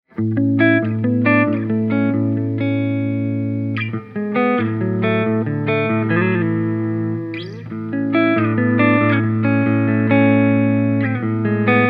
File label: clean, humbuckers; origin plus